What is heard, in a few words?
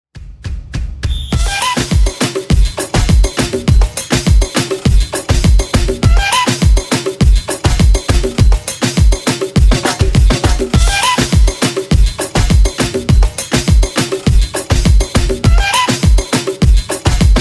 SAMBA 51bpm